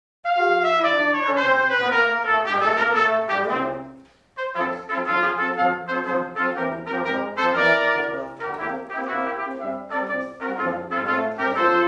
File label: brass